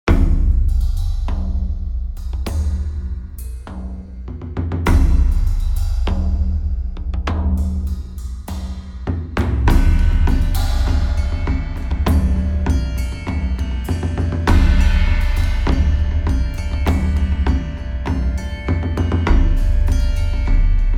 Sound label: Dramatic